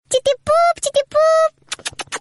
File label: funny tone ringtone; chikipoop